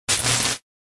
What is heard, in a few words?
lightsaber glitch